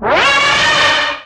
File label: cault hit; combat; enemy